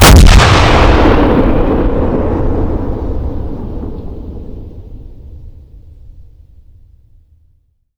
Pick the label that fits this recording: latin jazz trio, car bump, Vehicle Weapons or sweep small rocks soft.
Vehicle Weapons